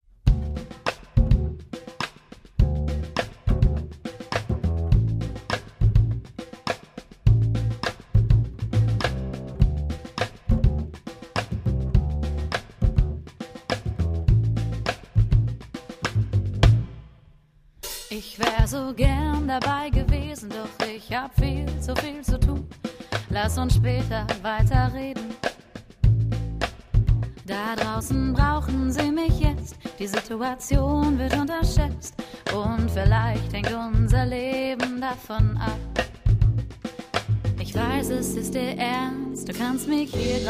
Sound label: Pop 2 Jazz